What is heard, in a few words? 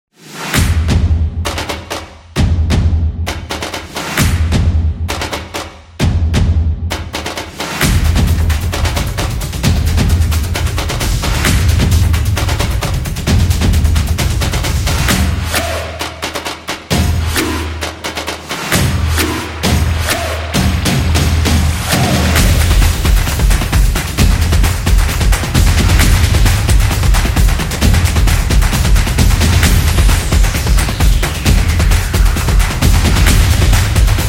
Sport Vlog